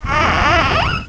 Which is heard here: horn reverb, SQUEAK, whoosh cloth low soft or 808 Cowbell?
SQUEAK